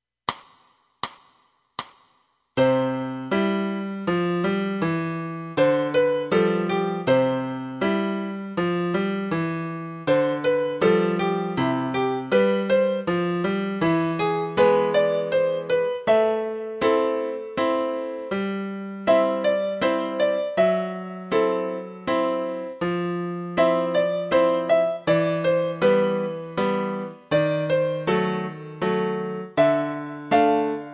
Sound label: piano